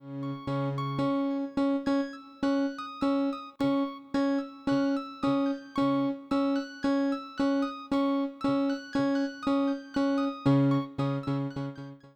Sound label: grand piano